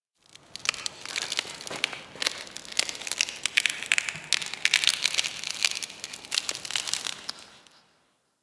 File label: Ice Creak Echo